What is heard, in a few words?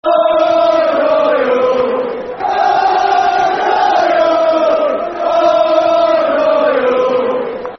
Argyle, Argyle, Football Chants; Plymouth Argyle Pafc